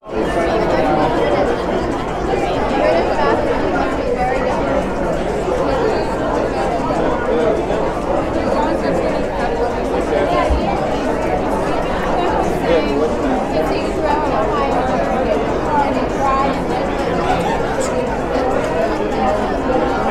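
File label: Restaurant Ambience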